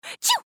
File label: Sneeze